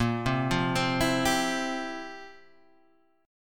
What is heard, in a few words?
F Major 7th Suspended 2nd Suspended 4th, Bb, guitar